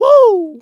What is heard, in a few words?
"woo", male